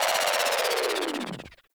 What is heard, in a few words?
Excavation Drill Loop End; Warframe; Missions; Excavator